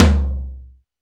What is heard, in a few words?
tom hr lo; Drums; Ableton Foundation FreePacks